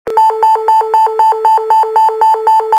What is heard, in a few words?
notification sound